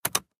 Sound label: notify email